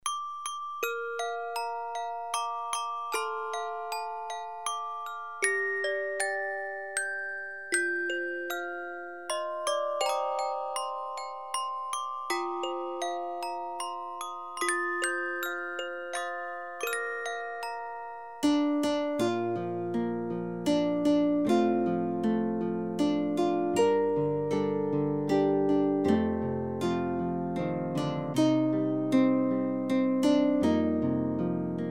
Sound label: Lullaby